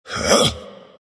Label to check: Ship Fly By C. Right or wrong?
Wrong